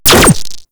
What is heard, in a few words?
Drone Laser Fire; Warframe; Corpus; Super Moa Drone